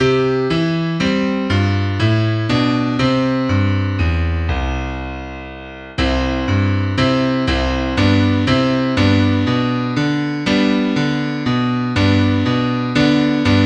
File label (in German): suesser die glocken, solo mit klavier; musik zur weihnachtszeit